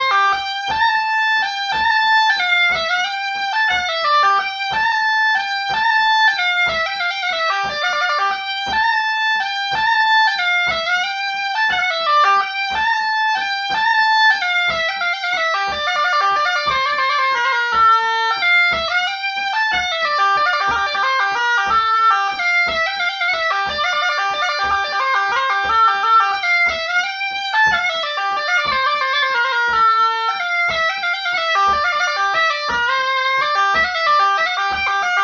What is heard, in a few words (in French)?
Bourrée Populaire; Bourrees